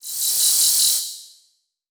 Transition Electrical Warble; Production Element Title Transition

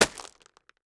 Sandbag Heavy Hitting Rocks; Emergency Effects; AdobeCC

